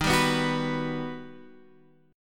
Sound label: G Augmented Major 7th